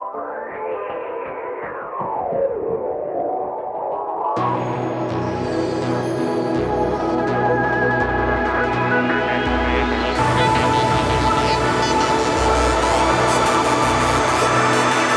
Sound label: Jingle Intro; PS Vita